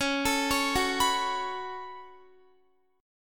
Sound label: Db 7th Suspended 4th Sharp 5th Db down Guitar; arpeggiated